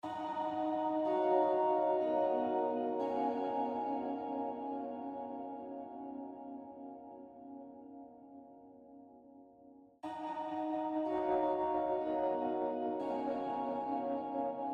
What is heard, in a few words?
tremolator